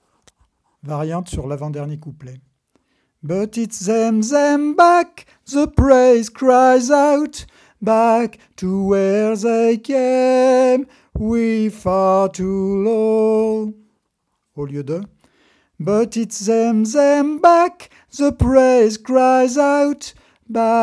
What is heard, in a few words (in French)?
Voix Variante; Song of the lower class; Version originale Ernest Charles Jones (1852)